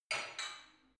barbell only lift up reverberant soft, fitnessstudio